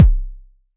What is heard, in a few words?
POP; ModelCycles